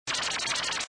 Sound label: Tails Flying; Tails Nightmare 3 Demo